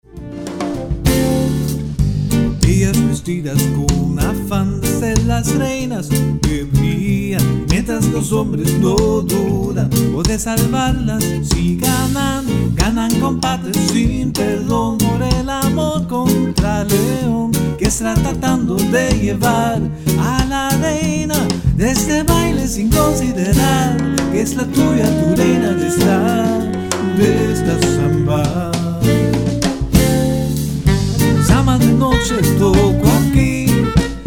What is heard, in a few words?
SAMBA